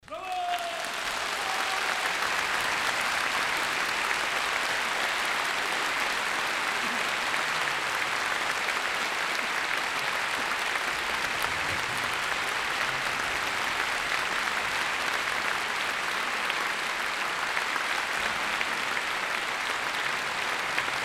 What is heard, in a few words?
Applause